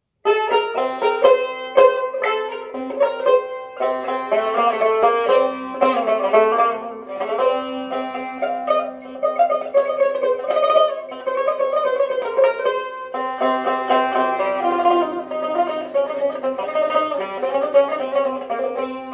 tar Azerbaijani; ساز تار آذربایجانی

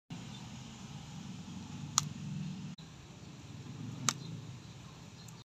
Picking fruit from tree; چیدن میوه از درخت

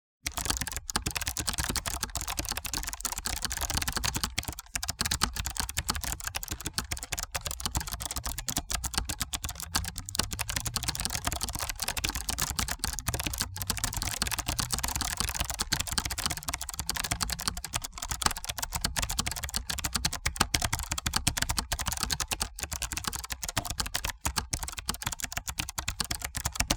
keyboard typing fast

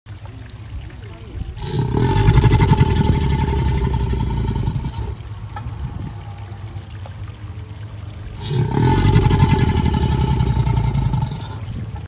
Black caiman; کیمن سیاه

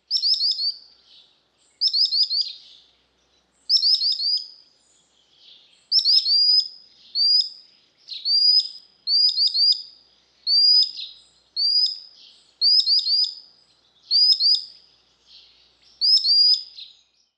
Sandpiper; آبچلیک

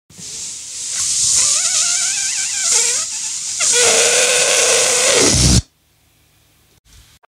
Deflate

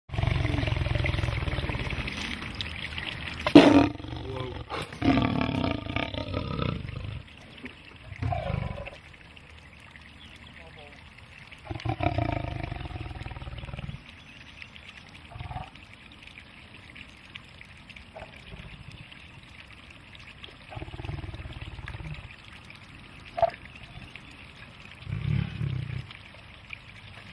Liger

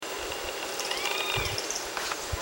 Banded cotinga; پرنده گوهرمرغ نواردار

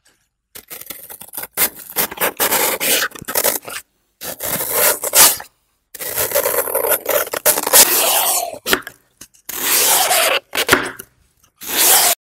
Flower sponge, صدای برش اسفنج گل

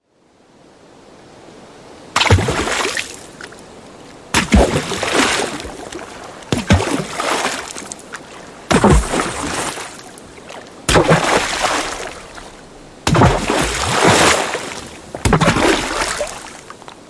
Splash Sound Effects

